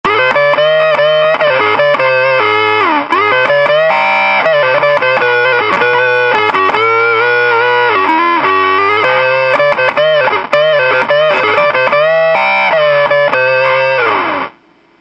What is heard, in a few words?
octaver; custom kit